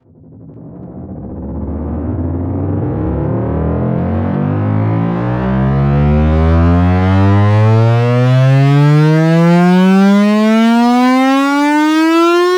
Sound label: FX Sweep Up; Sweeps Up; Vengeance; Essential Clubsounds Vol.3